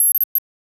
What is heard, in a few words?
GUI; 99Sounds; Cinematic Sound Effects; Generdyn